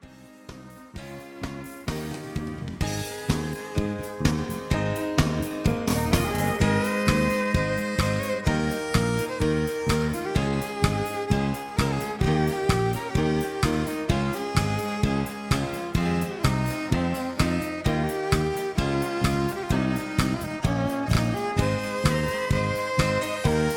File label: High Key